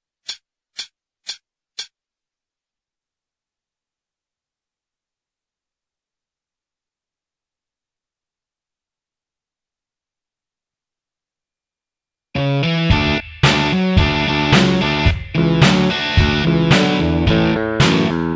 intro backing 110

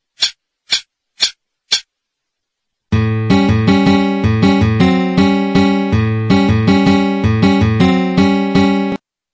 Acoustic Guitar Blues 160

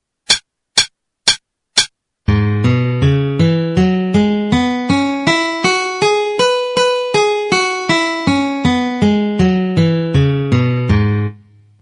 C Sharp Minor Pentatonic 160

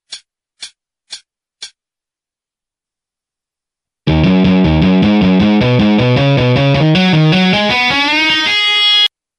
solo, guitar

